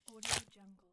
flesh gut drop spill; stormwaveaudio

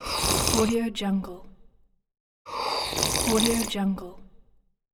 sipping

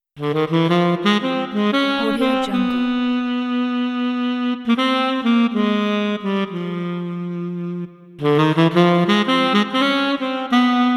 sexy tenor saxophone solo

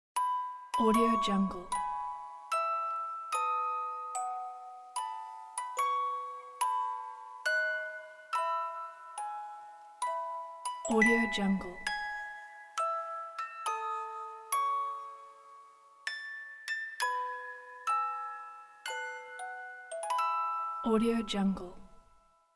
music box happy birthday tune

